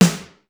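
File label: room snare; sr16 sd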